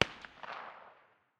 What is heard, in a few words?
smg 9 far distance; tacz-tweak-pack; taczmechanics